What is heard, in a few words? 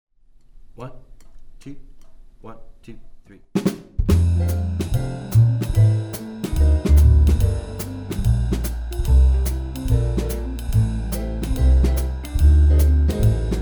swing etude rhythm section piano muted